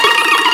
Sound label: SITRPLAY, Fairlight CMI SFX